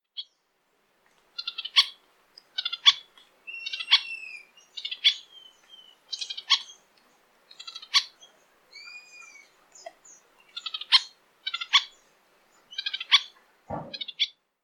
Canto do Pichorore

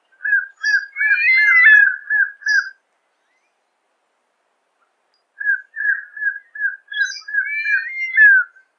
Canto do Gaviao de cabeca cinza

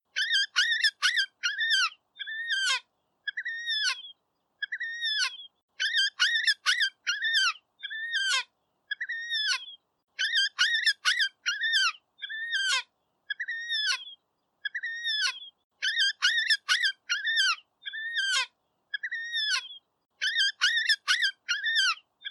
Canto do mergulhao de pescoco castanho

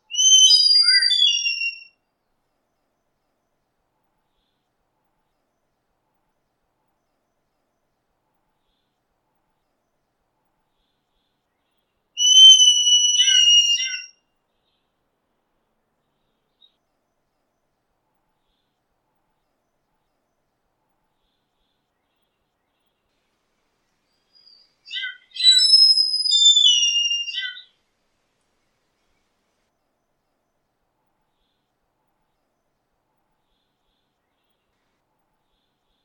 Canto do Gaviao pombo pequeno

